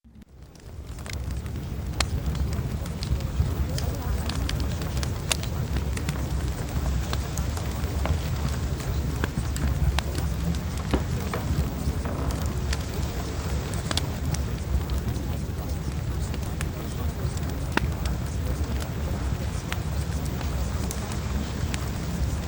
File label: Fireplace; Premium Subliminals